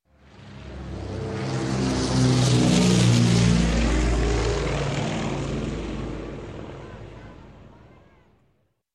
Twin Prop Otter Overhead Pass; Sound Ideas; Series 2000

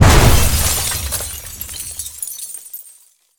general crash; Blind Drive

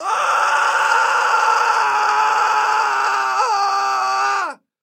scream male loud; Blind Drive

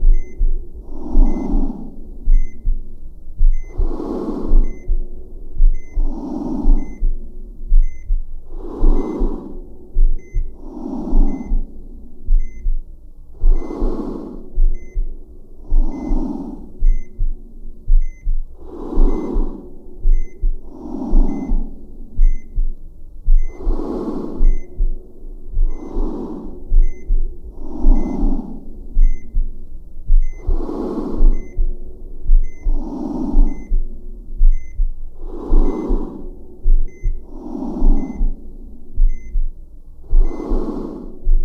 breath heart steady; Apotheorasis

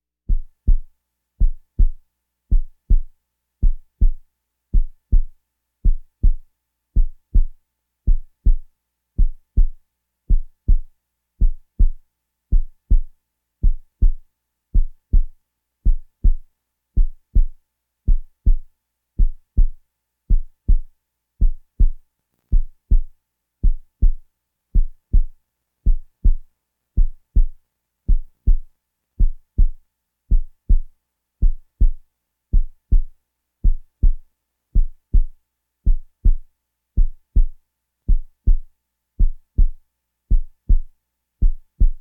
Walking Machinery Trucks

